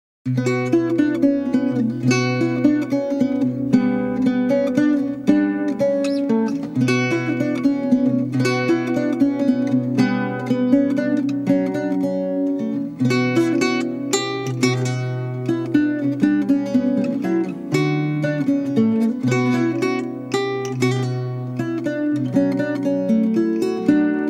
instrumental with lute

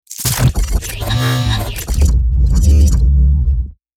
hit complex long

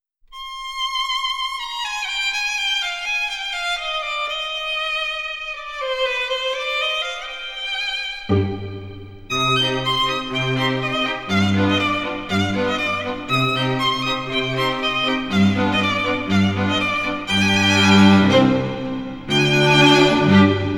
menuet violin; sayonara zetsubou sensei

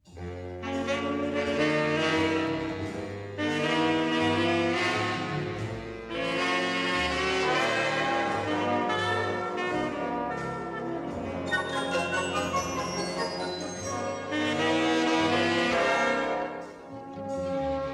strings orchestra

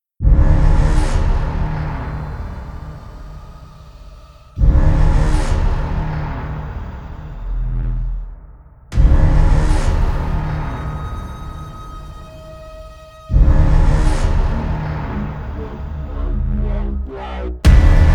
no choir

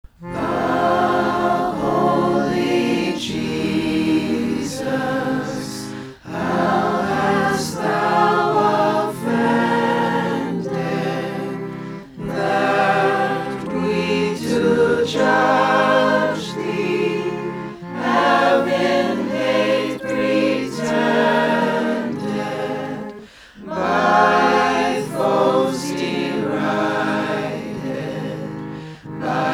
with reed organ

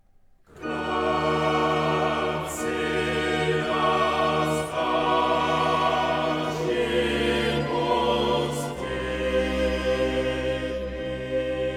coro

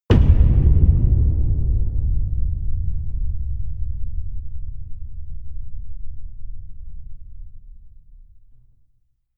booms sub attack

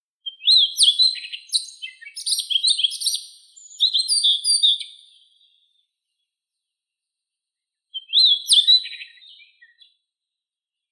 solovei krasnosheika luscinia calliope

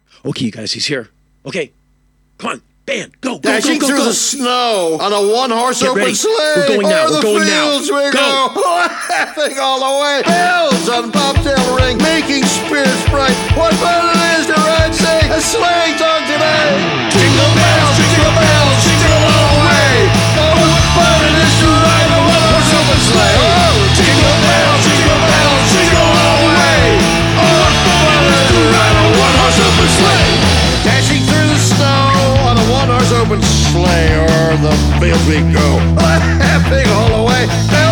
jingle bells punk rock version

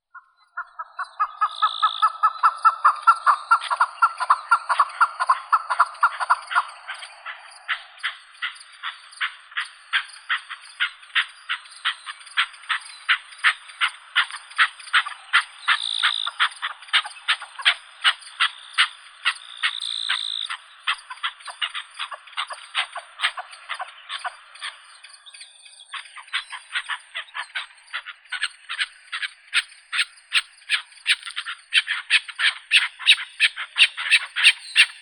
chukar, Alectoris chukar; keklik

